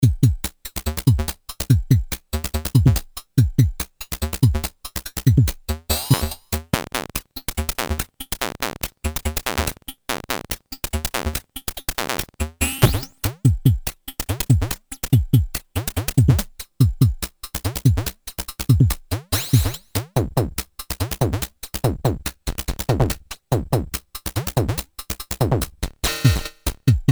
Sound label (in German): Blast Beats Drums manuelle Modulation; Twisted Electrons